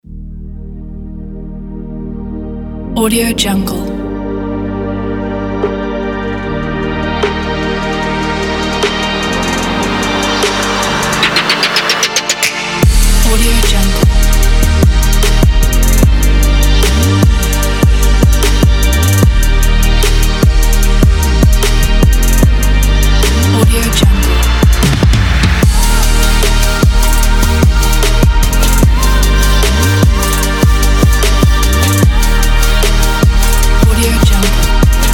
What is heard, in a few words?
chill future trap; maikonmusic